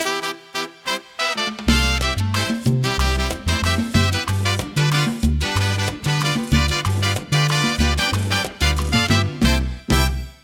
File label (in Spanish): Merengue